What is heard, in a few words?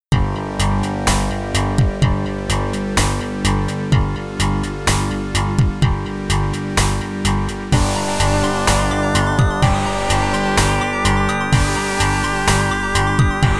menu music; Space Hulk; PC-9821